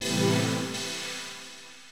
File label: SYNTH PAD HOUSE 125 A; eJay; Sphere